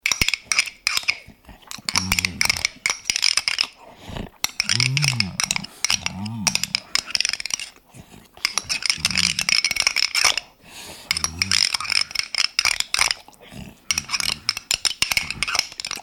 Glutton, Greedy eating from a bowl.; Eating (Sound Effects:Human)

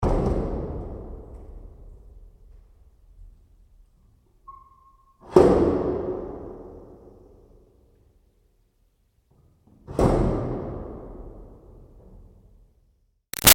Latch Metal Silo Boom Big Reverb; Latches; Objects

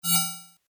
Rollover, Short

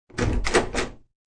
Old Door Open, Older door is opened; Miscellaneous Doors

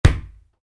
Soccer Ball: Kick Ball; Football kick; Sports; Stuart Duffield

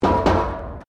METAL BIN IMPACT CONCRETE

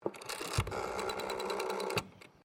TELEPHONE WOODEN AUTOMATIC DIALING WALLPHONE DIAL; Various old telephones; Telephone Sound Effects; Electronics

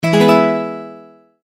multimedia tone advance; Sound Design; McKinney Sound

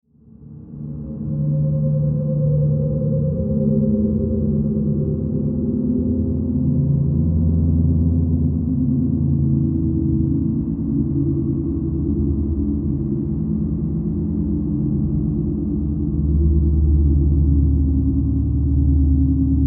Bed Ghostly Haunting Reverb; Bed Sound Effects

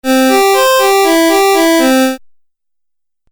Game Music Phrase

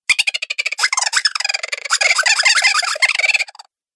Chipmunk Laughter; voice; Laughter Sound Effects

